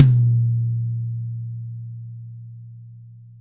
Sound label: PR12TMDMSL, drums toms dry; Real Mega Drums